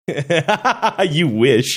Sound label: laughing saying you wish